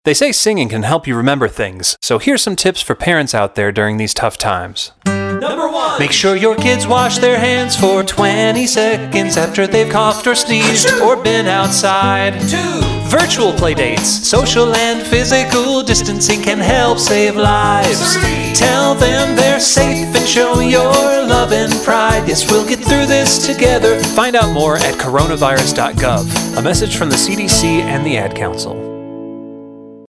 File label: Song, Radio Mix; Ad Council